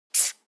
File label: office marker line short heavy; foley; smartsoundfx